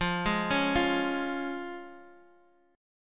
Db Major F

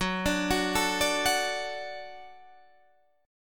D Major F sharp

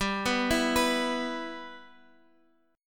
B Suspended 4th Sharp 5th G

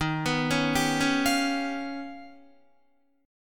Eb Augmented 9th Eb down Guitar; Eb+9 arpeggiated; JGuitar

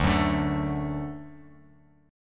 D Major D down Bass Standard; D strummed; JGuitar

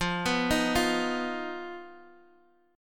B-Major Flat 5th F

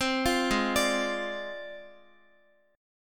Ab 6th Flat 5th, Guitar, Ab6b5 chord; Ab6b5 arpeggiated; JGuitar

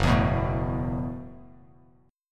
Gb Minor 11th Gb, Bass Standard, chord; strummed; JGuitar

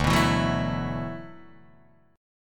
D 6th Add 9th D, Guitar, Open D

